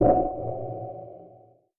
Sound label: Wet Percussion; Belief